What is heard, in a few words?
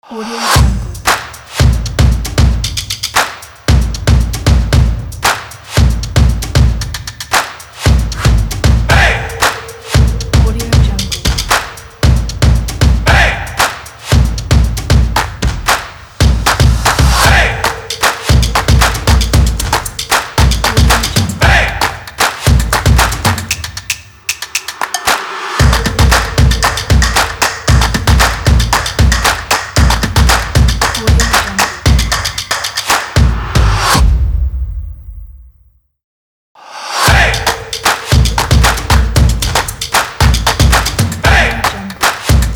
the drums